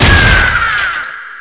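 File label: computer explosion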